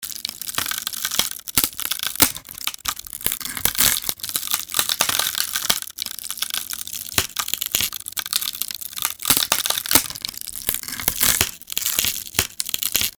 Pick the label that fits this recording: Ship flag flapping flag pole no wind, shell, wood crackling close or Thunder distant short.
wood crackling close